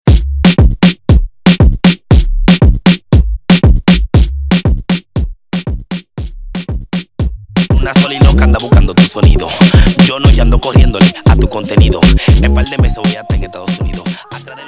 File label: Intro Verso 118Bpm